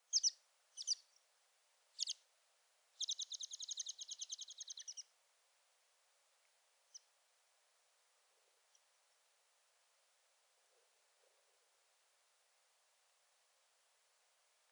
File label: American Pipit, Anthus rubescens rubescens